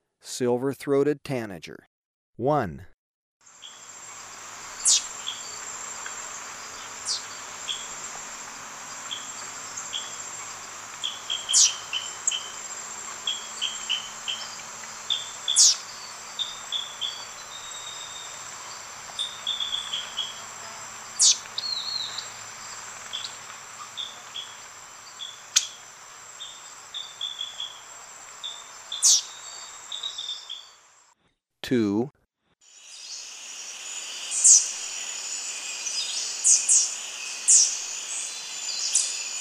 Silver-throated Tanager, Birds of Ecuador; S. America

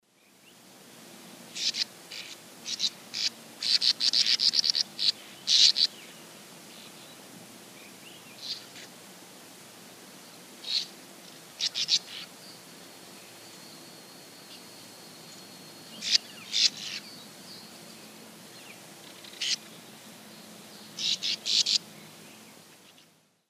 Riparia riparia, calls; S. America; Eastern Lowlands

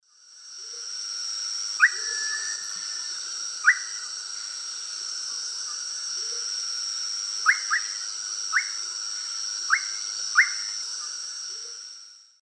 Psarocolius angustifrons, calls; S. America; Eastern Lowlands

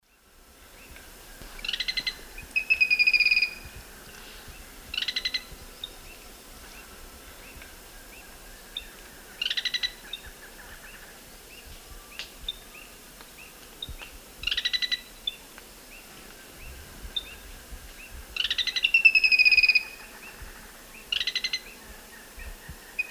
Hemitriccus minimus, calls and song; S. America; Eastern Lowlands

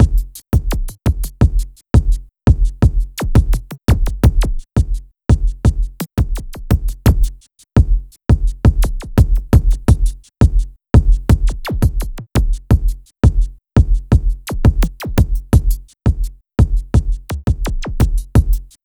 Drums, Rock

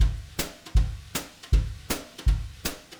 Drums, Jazz

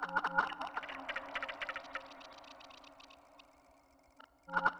Warp Wave Drum; Ambient Cinematic; Rhythmic Atmospheres

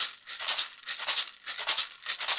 maracas 100 bpm, latin; Sound Effect Library

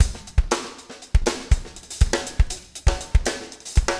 breakout 120, 120bpm, loops

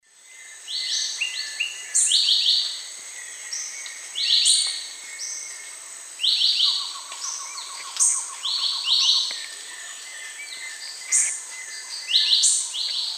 Euphonia xanthogaster, song; S. America; Eastern Lowlands

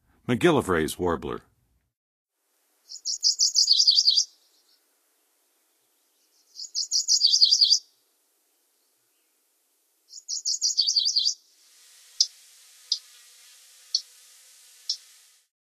MacGillivrays Warbler; North America; Western USA; Western USA Songbirds

